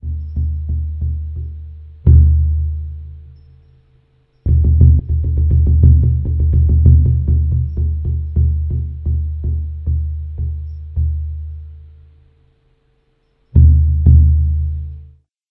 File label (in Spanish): bombo